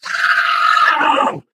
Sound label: ballbot laser burn